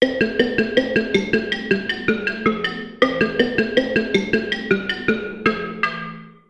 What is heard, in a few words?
keys